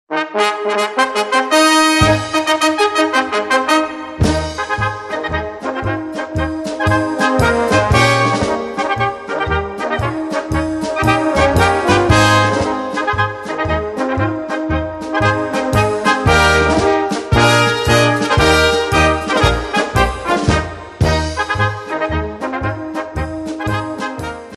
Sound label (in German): Marsch